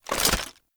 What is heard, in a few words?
grenade launcher reload; Weapons; Scaldra